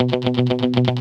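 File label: GUI 120, guitar; Best Service Gigapack 2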